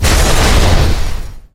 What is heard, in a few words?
molotov detonate; weapons